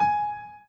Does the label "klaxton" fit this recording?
No